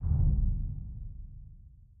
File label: reel stop; rise of maya